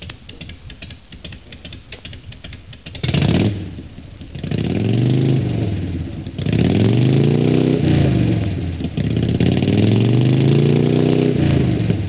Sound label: harley